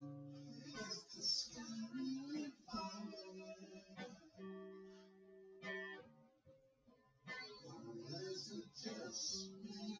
test nodrums synthconv 250ms 5cm src; SiSEC08; SiSEC underdetermined

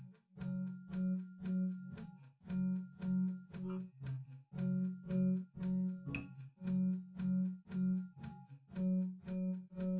drums live rec 250ms 1m; SiSEC11; underdetermined

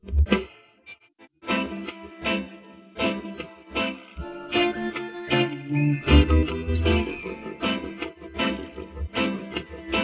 guitar

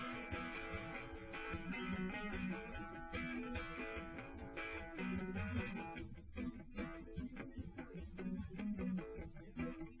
wdrums live rec; SiSEC08; SiSEC underdetermined

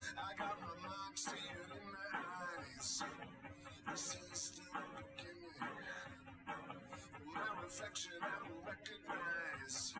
test wdrums liverec 250ms 5cm sim; SiSEC08; SiSEC underdetermined

